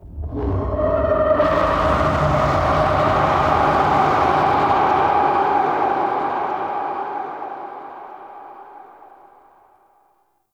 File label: Sentient Vessel Delay Scream; Warframe; Tau